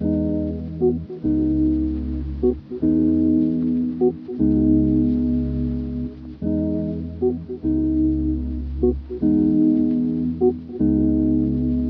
Lofi Melody Loop 1 - 75 BPM G# Min Keys; Cymatics; Lofi Starter Pack; Eternity

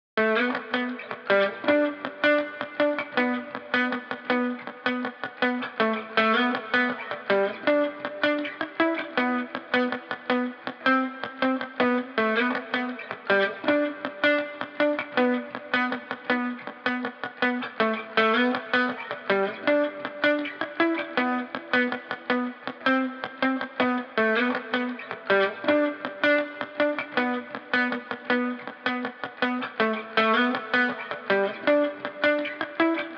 80 BPM E Min Muted Guitar; Loop Stems; Cymatics; Abandoned

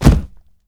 Slams Wall; Fairlight Sound Lib

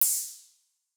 ride, cymbals, drum one shots; Rides; Cymatics; Cobra Hip Hop Sample Pack

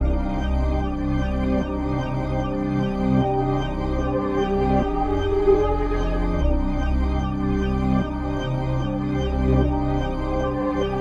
150 BPM C Min, Trap Melody Loops; Abyss; Cymatics; Nightmares

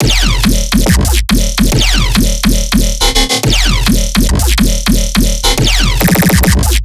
Riddim Bass Loop 140 E; Dubstep; Riddim Basslines; Gumbo; Ghosthack; Advent Calendar 2019 Day 17; Riddim Bass Loops

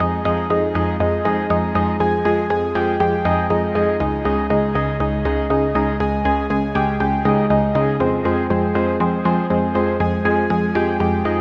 Synth Fm7 120Bpm, House; Musical Loops; House Kit; Ghosthack; Advent Calendar

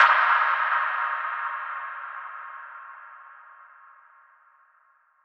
Impact; Aerials; Cymatics; Trap Starter Pack

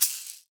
Studio Shaker; Cymatics; Lofi Starter Pack

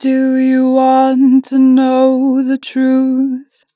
The truth, 2 bar vib, Vocals, Natural Vibrato; Harmor Vocals

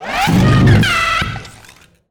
wooshes bent metal

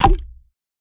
Craft Air Bubble Suction Pop Cork Water UI; User Interface

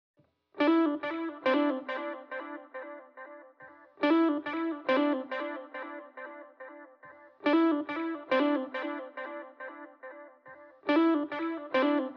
guitar, 140 BPM A Min; OB6; Stems; Cymatics; Manifest

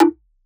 Grime Perc, Percussion; Drums; One Shots; Cymatics; EDM Starter Pack

